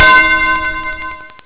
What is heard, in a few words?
get ready; pang